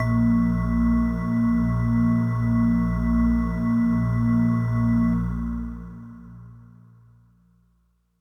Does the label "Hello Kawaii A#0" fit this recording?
Yes